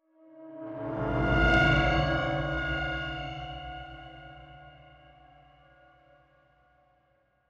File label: Enemy Enter; Very Little Nightmares; Android